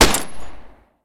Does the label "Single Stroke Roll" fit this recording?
No